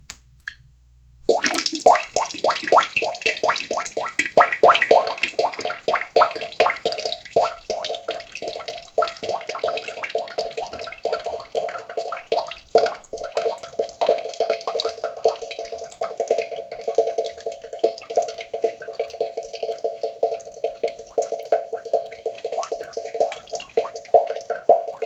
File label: small plops like somethin, falling